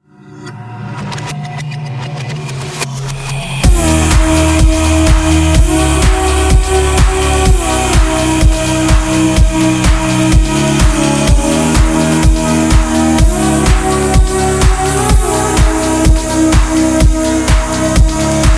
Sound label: trance